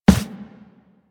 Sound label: Run Default, Footsteps; Warframe; Operator